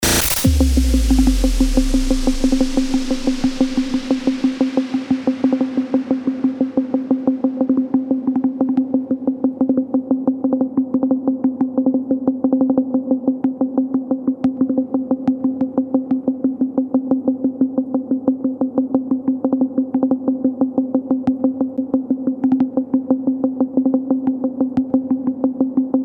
DRONE PONDERING 90BPM Fm

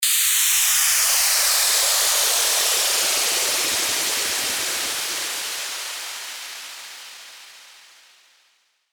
WHOOSH

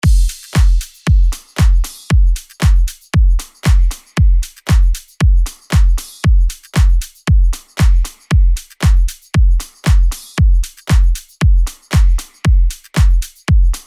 DRUM LOOP SLOW HOUSE 116BPM

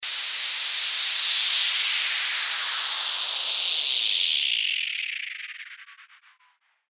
WHOOSH

